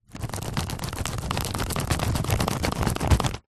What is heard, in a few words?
office glue stick rub paper fast